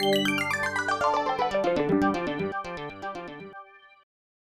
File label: Fanfare; asterix 1993 snes; Infogrames Fanfare